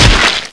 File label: Pan Splat